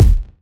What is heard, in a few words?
short kickdrum sound d key, bass drum one shot tuned to the D note